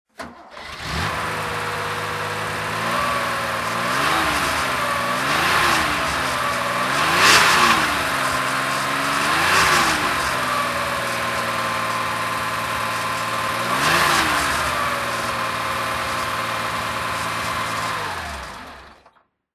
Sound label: porsche 911 2.2 s throttle